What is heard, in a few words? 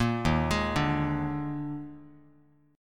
Eb Major 7th Suspended 4th Eb, Bass Standard; arpeggiated; EbM7sus4